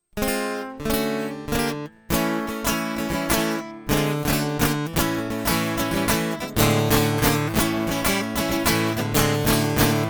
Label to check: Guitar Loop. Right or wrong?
Right